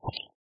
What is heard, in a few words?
retrieve, bobber; Minecraft